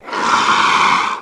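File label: howler monkey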